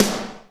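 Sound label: snare, Techno Rock; Northstar; Drumscapes Roland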